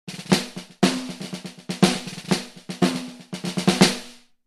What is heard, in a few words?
gretsch loose over tighter; Loose top head, even tighter bottom head; Gretsch 5 x 14" maple